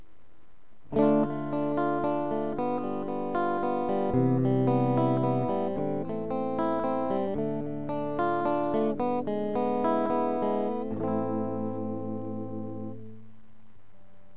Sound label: softer electric